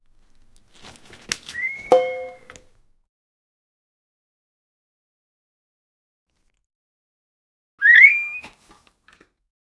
puppy sound

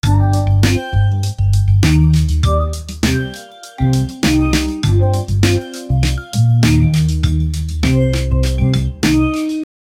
fast paced energetic vlog, bouncy

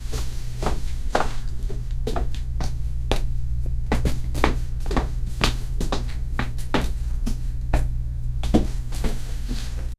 horror foot steps, scary

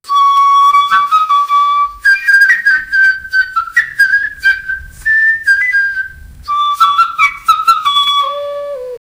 basuri sound with child, birds chirping

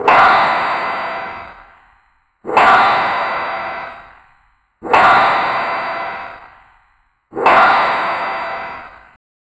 goblin

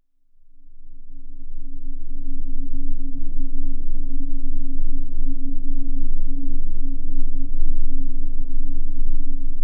the sound of space, space

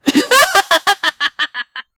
laugh of evil loud, villain

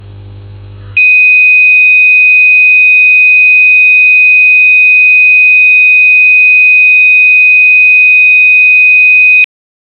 audio signal when the rad, communication

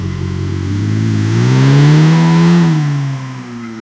car v8 straight exhaust

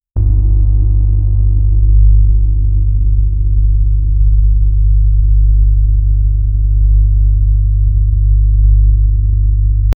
warm homestyle hum

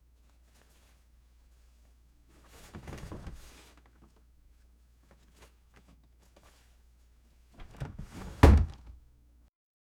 a cardboard box is set

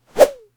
person slamming a person, slam

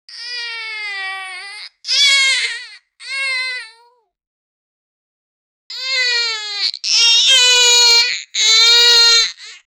child crying; unhappy

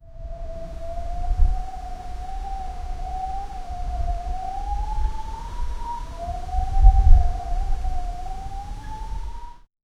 wind by the farm, rural

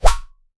arpg ui equip heavy bl; mono

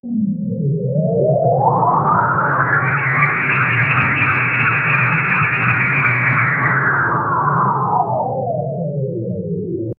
spaceship flying, flyby

